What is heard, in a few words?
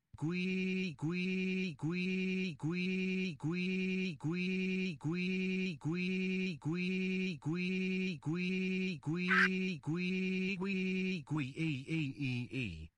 alarm